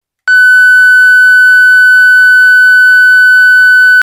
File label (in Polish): ton